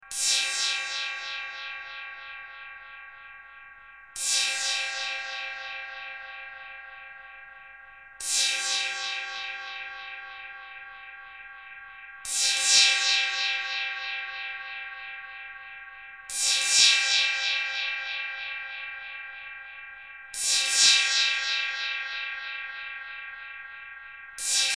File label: boss 808 cymbal; shootout